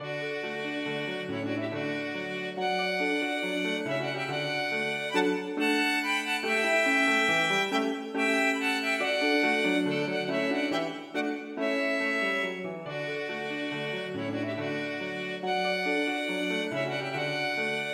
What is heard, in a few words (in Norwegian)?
Menuet for to fioliner og piano